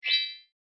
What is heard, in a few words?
flash box